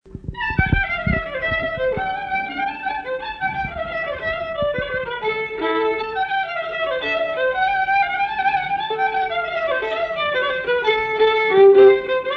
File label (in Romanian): Melodie de joc la vioara; jocuri si cantece batranesti; Vaslui